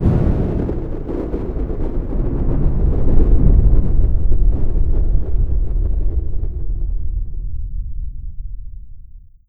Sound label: thunder; fgdata